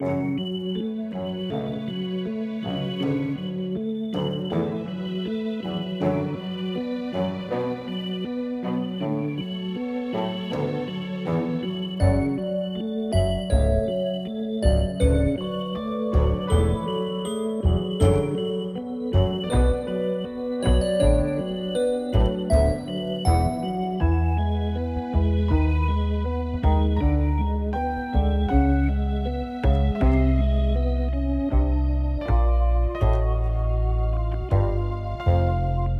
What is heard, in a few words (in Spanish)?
music cementerio; lootlite